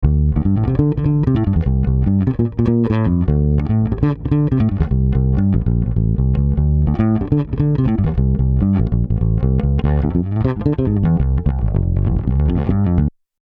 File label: Chandler TG 1 Bass; Kramer Trick